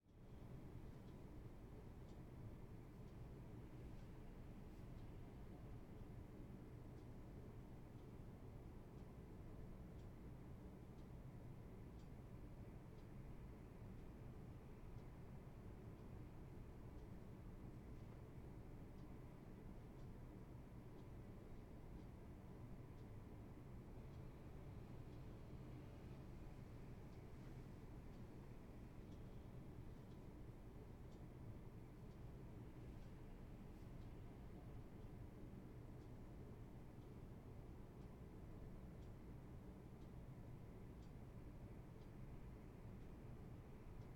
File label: Room Hotel Room; AmbienceYamiya